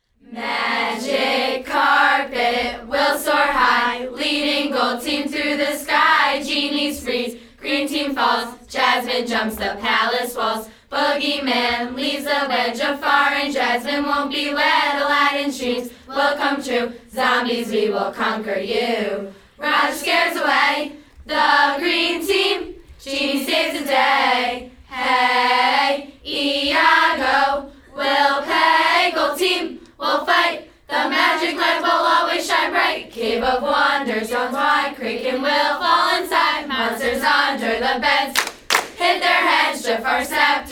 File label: cheers